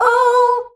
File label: "OUUH" B, FEMALES; Best Service; Hyper Dance